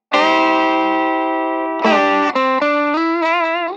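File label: Guitar Solo; гитара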